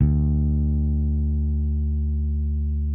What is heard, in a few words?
BASS D2, electric bass